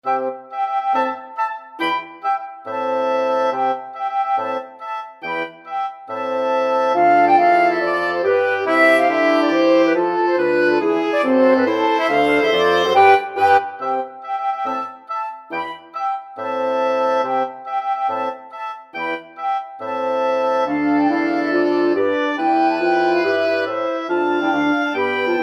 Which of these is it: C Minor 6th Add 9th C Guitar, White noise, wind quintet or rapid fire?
wind quintet